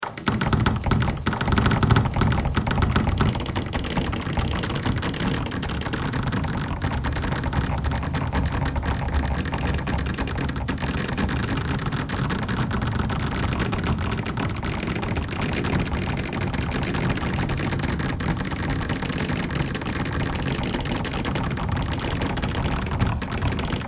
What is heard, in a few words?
bagpipe thread noise; bagpipeclick